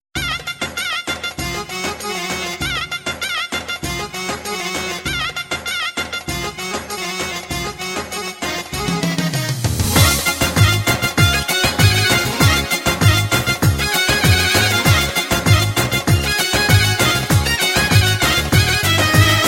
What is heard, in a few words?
Balkan Turbo Folk